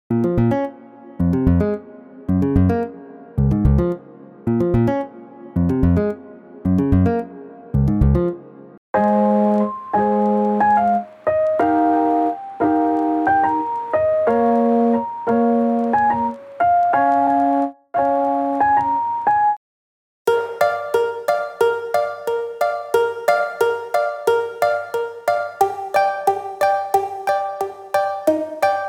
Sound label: Folk & Indie Full; unisynth